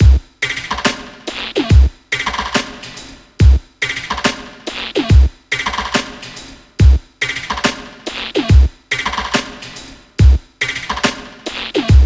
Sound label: A drum loop